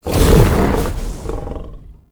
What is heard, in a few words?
Flight End; Warframe; Uriel; Infernalis